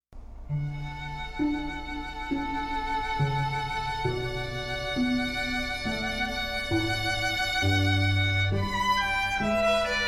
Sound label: String Trio; Wedding Ceremony Music